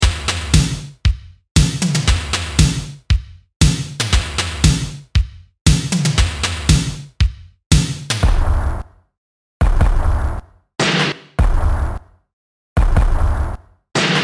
linndrum beats

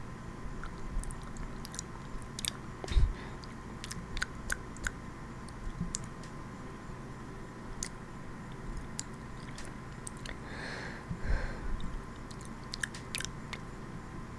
More Mouth Sounds! [Saliva buildup, saliva]

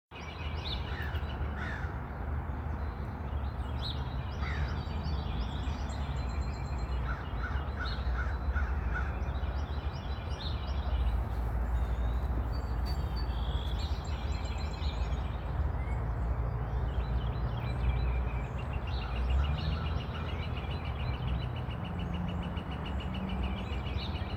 birdsong the other morning at sunrise

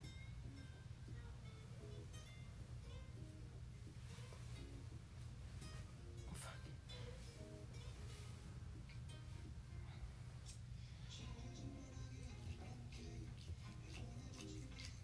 F4M Whimpering Wet noises; Quite Sunday Morning; Real orgasm; small grunts

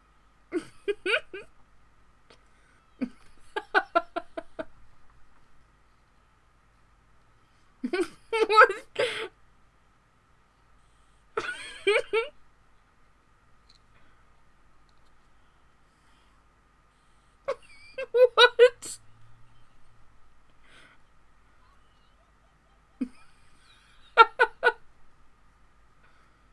Just laughing!

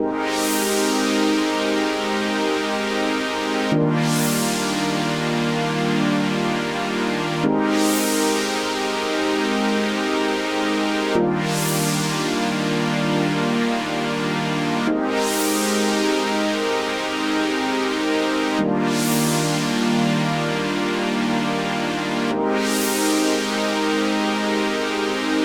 pads; Pony Fan Music Remix Stems

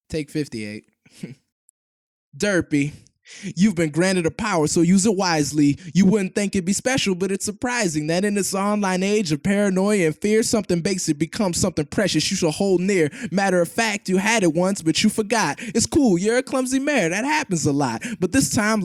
vocals only 97.5BPM; Acapellas

